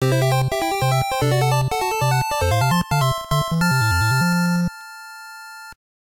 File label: Ice Hockey Goal